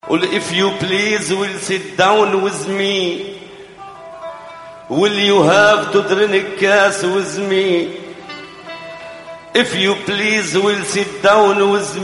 funny arabic song

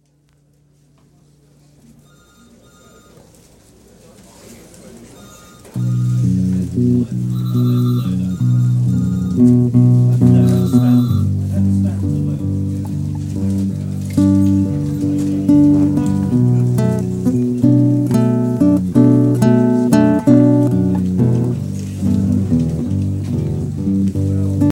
brazilian despacho instrumental

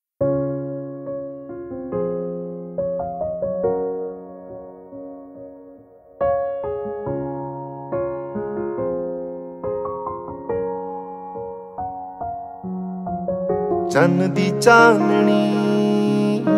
best punjabi ringtone

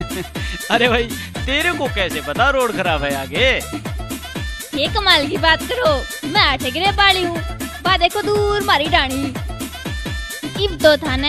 rajasthani pop ringtone

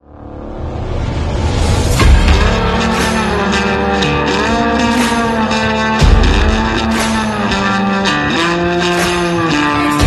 suspense filled; ringtone

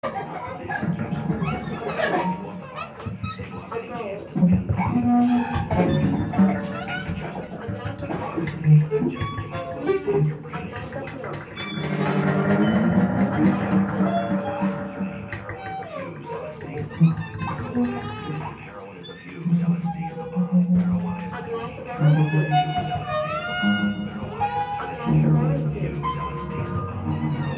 horror ringtone; portland

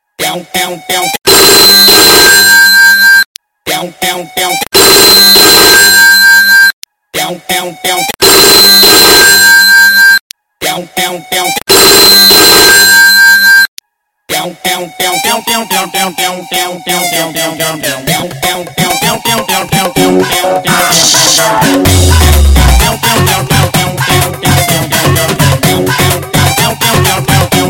tau tau tone; ringtone

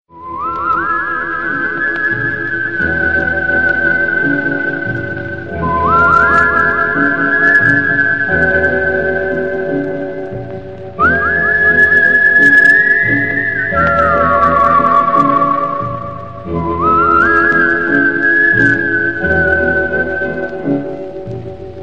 Bengali classic ringtone

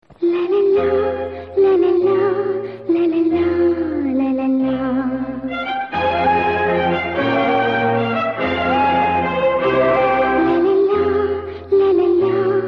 telugu ringtone; idea

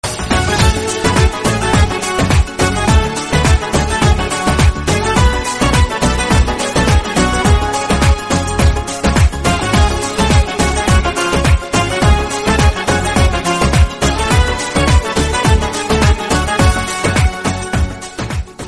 turkish music

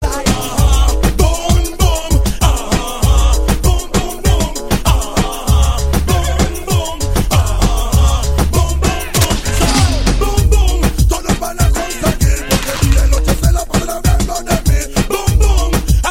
spanish ragga ringtone

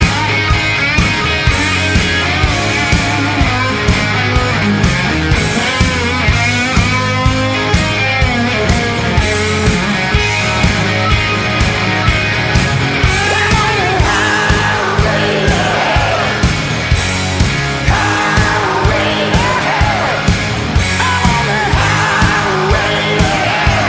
live music

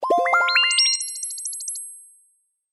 space men notification; ringtone

